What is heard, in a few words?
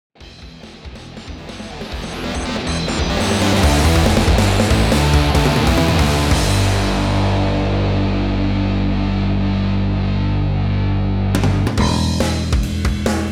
Result Win; the king of fighters xv